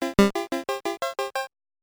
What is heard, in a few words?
Opening & Ending Jingle; Drac's Night Out; NES